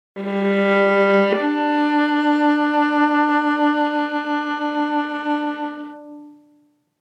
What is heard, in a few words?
legato interval; A simple yet effective method for more natural legato sampling